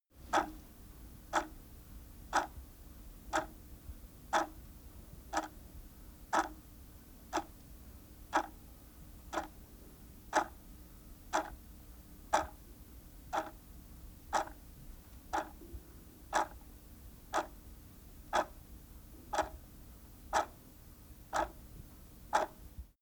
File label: wall clock tick